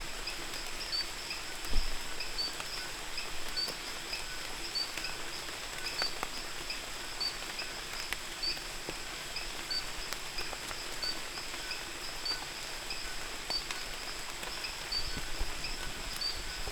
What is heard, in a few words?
Anomaloglossus blanci; ECourtois